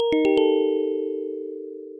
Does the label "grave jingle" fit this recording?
Yes